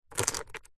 foley gemstones several small opal amethyst jade short movement handling on surface